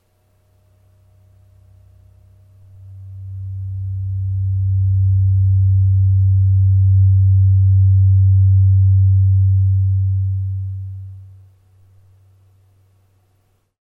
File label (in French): 64 96hz c et g diapasons thérapeutiques; medson